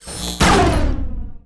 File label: war attack; HOBOROBBER